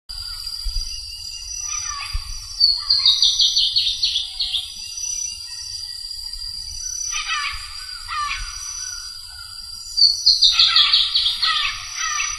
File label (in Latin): Drymophila rubricollis; PP Cruce Caballero; Jorge La Grotteria